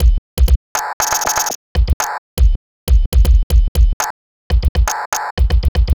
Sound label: Subtractive Beat